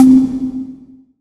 Metronome Sound Reverb; keplers laws

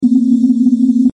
charges in body; john travoltage

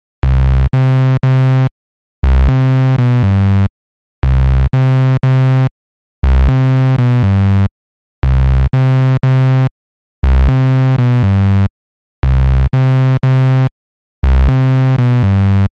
Low Distorted Tonal Rhythm; quad Track

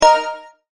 photon Release Visible; greenhouse effect

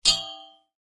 coil Bump High; faradays law

